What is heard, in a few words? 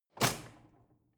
drawer cutlery close draw short